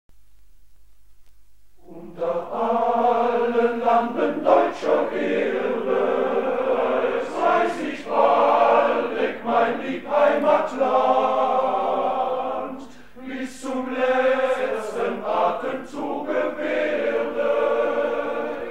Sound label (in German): Hymne